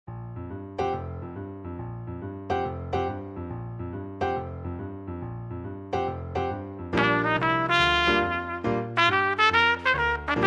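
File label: Big Chillers Trumpet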